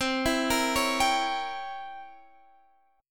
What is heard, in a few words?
C 7th Flat 9th C Guitar Open D